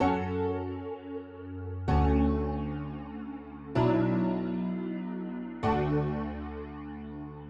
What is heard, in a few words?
DETUNER